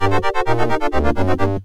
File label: OPL3; Tetris